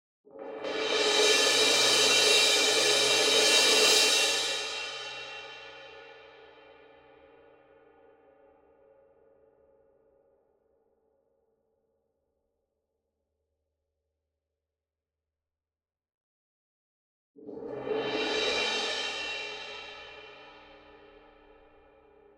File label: CYMBAL Crash Swell Soft Beater Medium; boom library; Cinematic Strikes Construction Kit